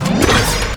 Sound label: Gun Draw Select Scifi, Weapons Foley; SFX Kit